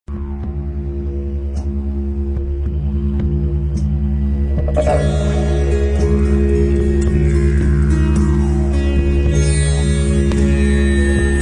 Tribal Trance, made me feel very calm and peaceful; Didgeridoo, Flute and drums; Bharat; australia